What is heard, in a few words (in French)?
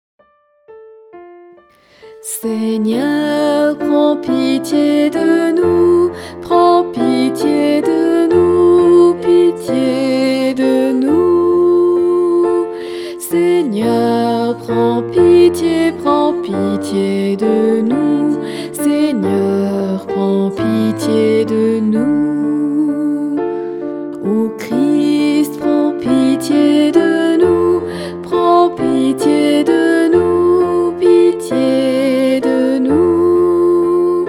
Preparation Penitentielle, Basse; Messe De L Agnus